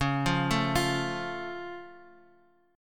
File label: C sharp Augmented, C sharp, Guitar; arpeggiated; 9,8,7,10,x,x